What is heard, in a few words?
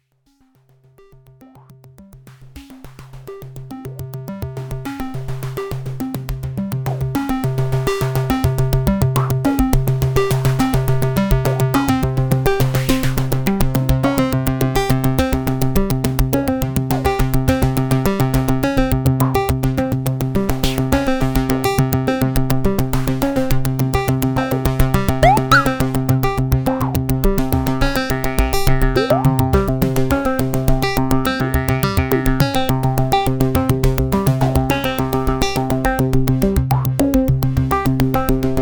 Arpeggio, synthesizer; GRP A4